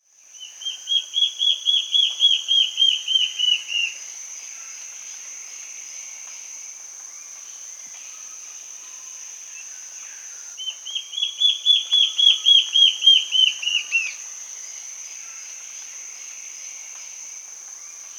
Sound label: Black-faced Antbird (Myrmoborus myotherinus), Peru; S. America